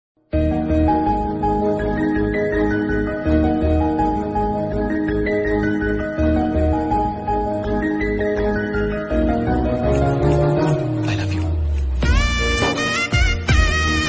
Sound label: telugu song